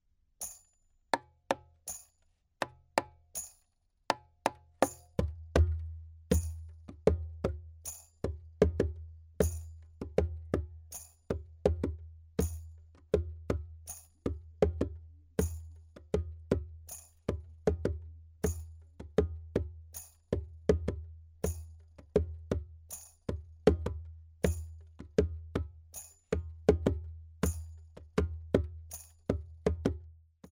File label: body drum